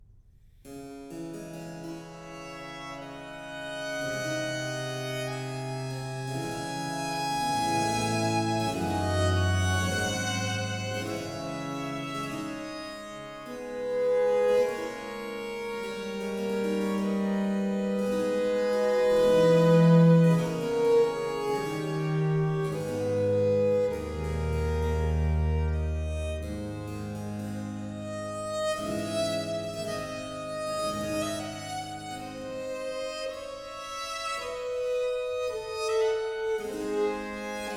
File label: Sonata in F major - Grave; 헨리 퍼셀; 4성부를 위한 10개의 소나타